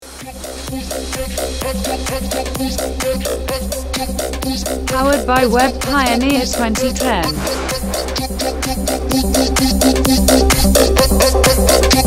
Aerobic Music; آهنگ ایروبیک شاد و تند

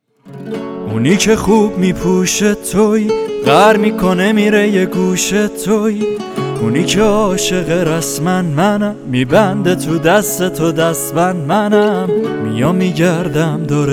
Guitar

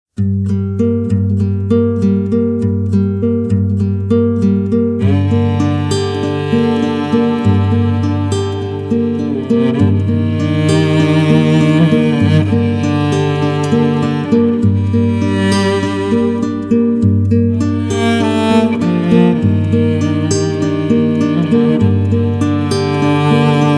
instrumental music turkish without word

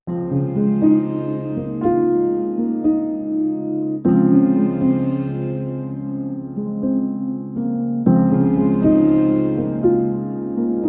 Classic music; آهنگ های کلاسیک برای مدیتیشن

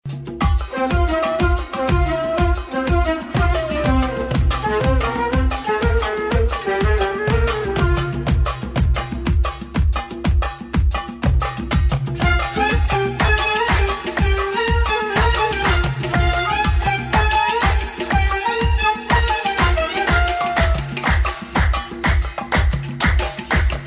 Ringtone

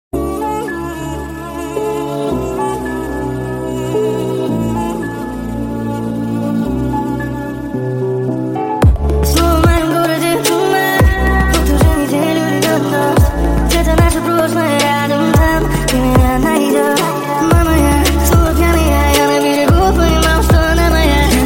Russian Songs

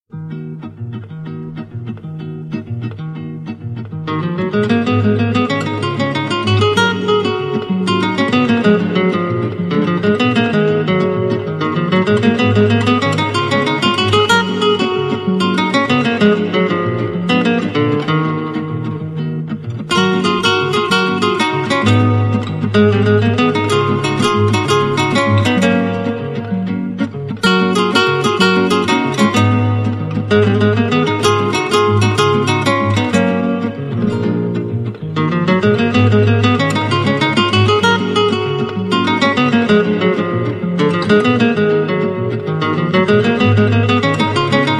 Latin Swing

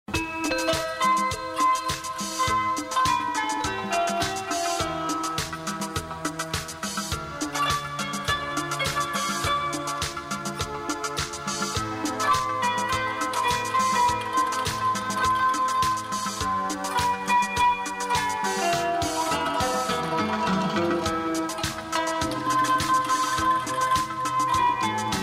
Instrumental music turkish with out word happy

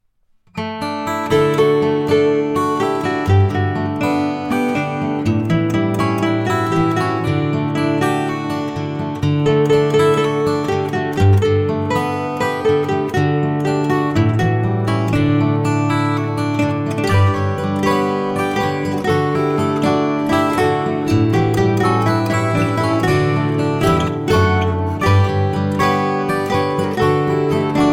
Without words Guitar; آهنگ های بی کلام گیتار معروف پاپ

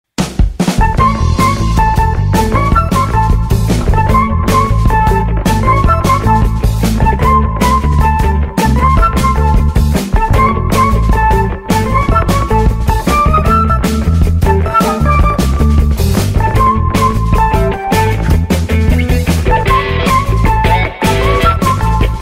Background Music

